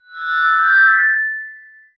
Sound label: ultra engaging entrancing, chimes